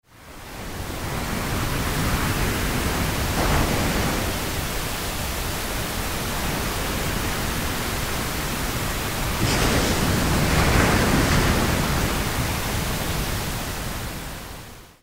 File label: pink noise and beach sounds; pink noise at the beach